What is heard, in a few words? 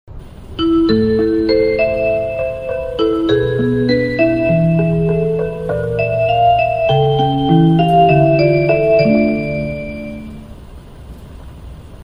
west chime